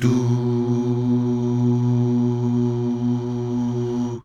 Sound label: DUUH